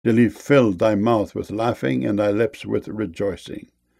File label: laughing